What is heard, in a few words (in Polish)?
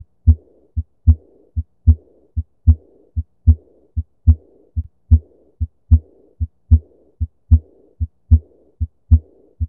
Szmer rozkurczowy z p max nad z aortalną